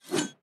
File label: Swing Spear Short